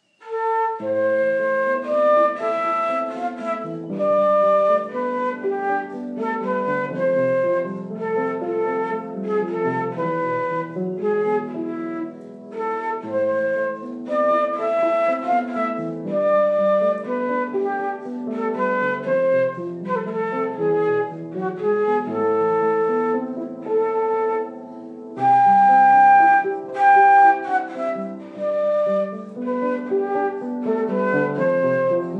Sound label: greensleeves on the flute